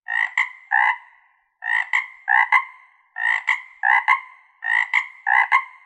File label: frog sound